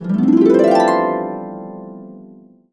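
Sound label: C major, Harps; USB Soundscan vol.62; AKAI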